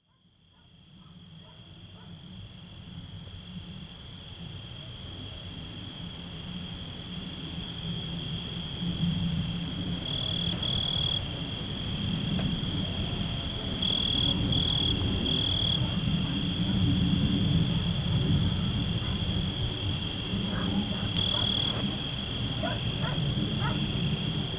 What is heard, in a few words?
Symphony of Crickets; deef; fades vesion